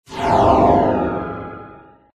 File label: ufo abduct